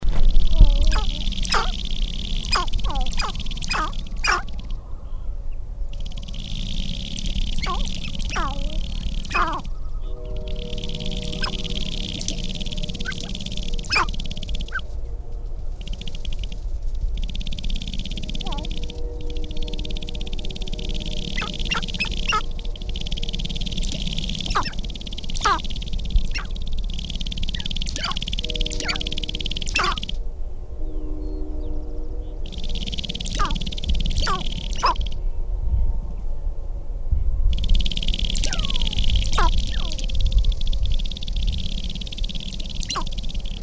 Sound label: long, Sharp-tailed Grouse; Gallinaceous